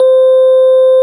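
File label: EP Gb3, electric piano Gb3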